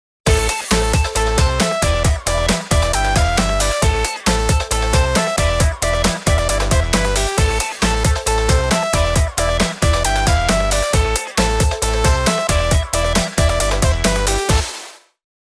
欢快的背景音乐

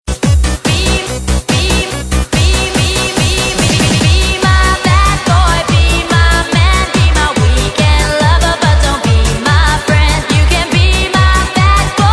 店铺叫卖背景音乐

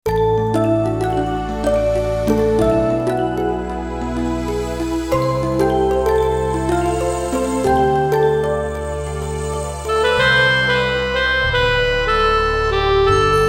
片头音乐

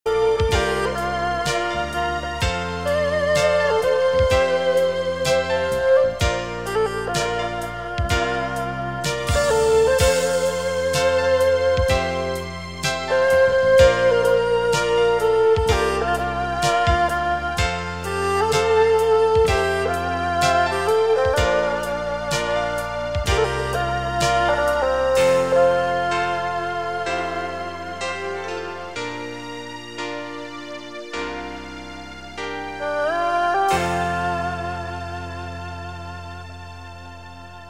广告片音乐; 广告片背景音乐; 3分以内

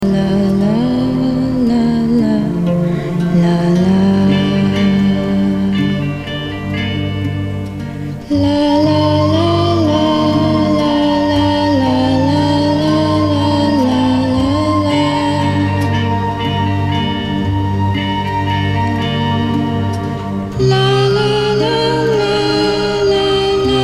电影音乐; 奥斯卡电影音乐

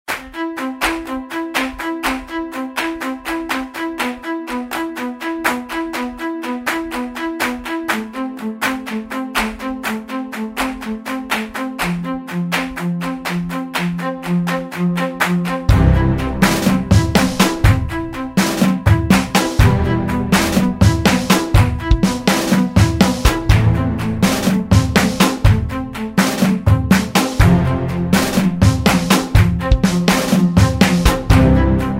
专题片背景音乐

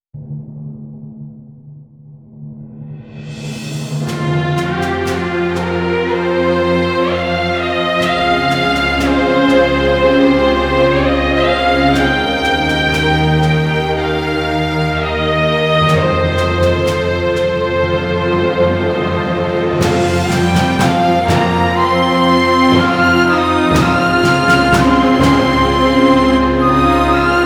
背景音乐

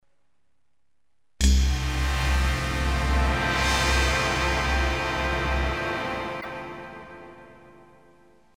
片头音乐

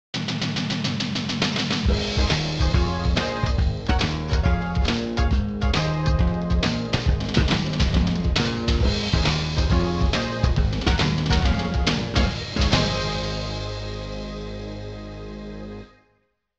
片头音乐

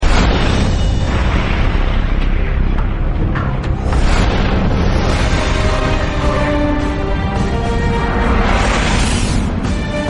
大气的背景音乐

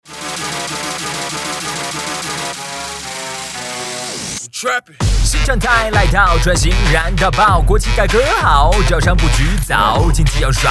男国 歌曲翻唱 说唱; 招商基金说唱

